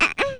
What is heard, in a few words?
music note; super mario paint